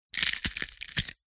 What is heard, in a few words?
horror bone break crush